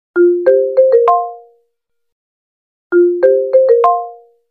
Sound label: Imo Ringtone